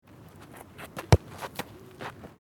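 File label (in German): Fussball Jugendtraining Schuss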